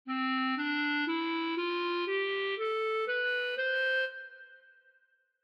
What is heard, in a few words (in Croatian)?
D dur, ljestvice, clarinet